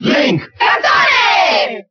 Cheer Italian; Toon Link; SSBB

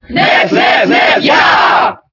Cheer German; Ness; SSBB

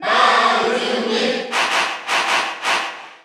Cheer Korean; Villager; SSBU

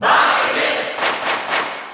Cheer Russian; Byleth; SSBU

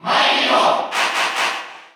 Cheer Russian; Mario; SSBU

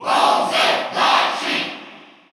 Cheer Russian; Bowser Jr.; SSBU

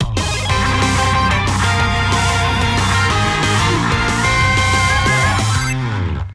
Victory!; Mega Man

